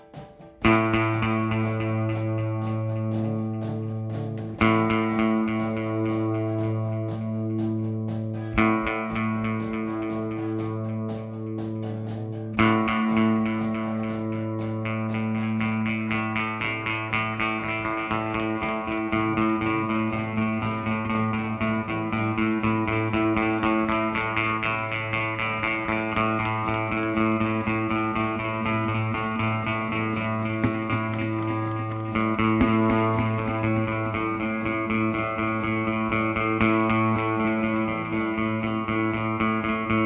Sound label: guitar